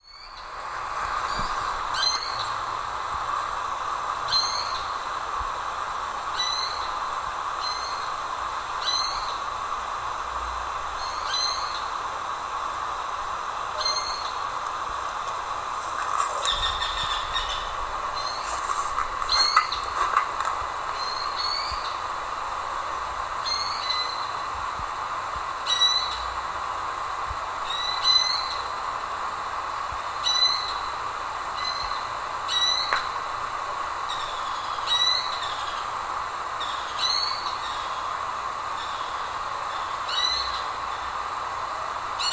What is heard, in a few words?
Eared Quetza, songs